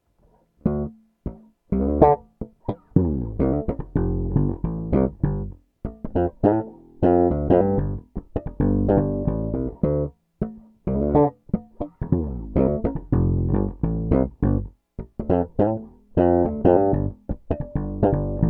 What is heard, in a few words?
Bridge Slap Tone DOWN; Peavey based pickup Shootout; Super Neo